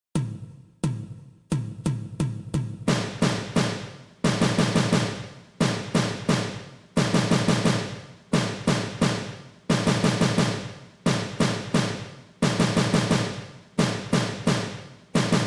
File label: Taps Diddles 88 bpm; percussion methods